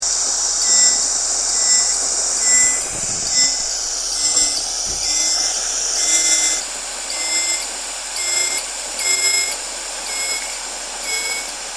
kleine hufeisennase; Fledermaus

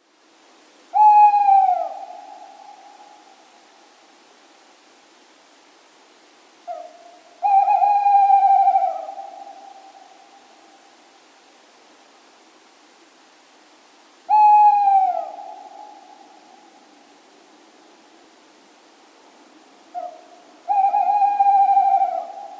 Waldkauz; nachttiere

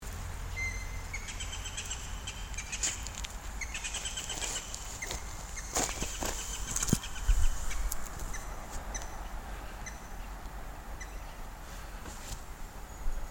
grauspecht

